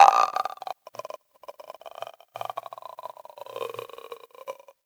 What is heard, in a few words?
horror aaaaaa